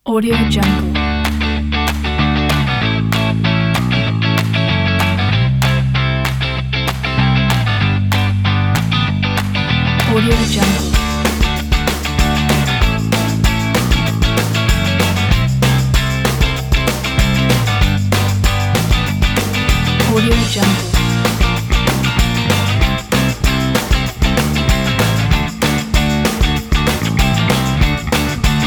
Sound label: lite rock